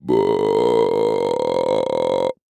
Horror Scary Demon Creak

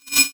Multimedia Internet CD-Rom Flash Short

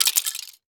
Crash Debris Plastic Dropping On Cement; crashes; AdobeCC

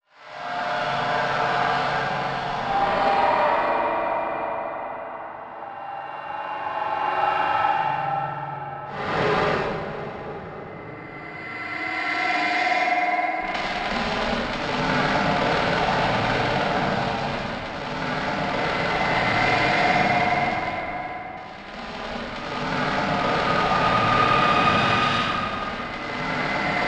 Choppy Spaced; Module Production Element Title Imaging; Imaging Elements

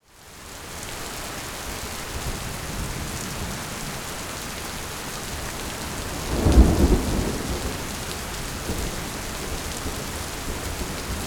Weather Ambience Heavy Rain Thunderstorm Thunder; AdobeCC

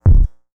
Multimedia Internet CD-Rom Flash Beep Electronic

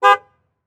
Truck Envoy Honk Horn Short; transportation

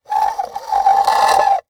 Foley Wood Screech On Concrete

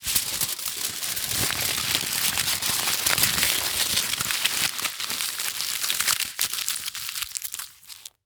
Foley Tin Foil Crinkling Into A Ball

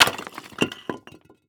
Impact Concrete Drop On Concrete Multiple

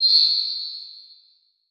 Beep Long; Multimedia Internet CD-Rom Flash

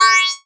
Beep High Pitch

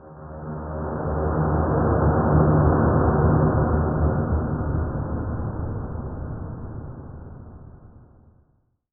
Transition Stab Dark Medium

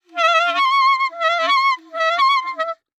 Cartoon Clarinet Song; AdobeCC

